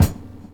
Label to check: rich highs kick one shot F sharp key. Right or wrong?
Right